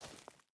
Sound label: footstep burlap dirt walk; Rust